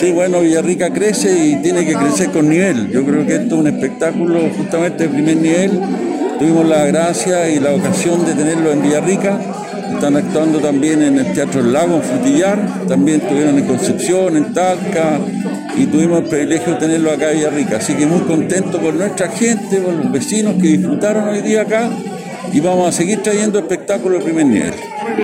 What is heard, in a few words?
Flamenco; publico villarricense en espectaculo unico inspirado en garcia lorca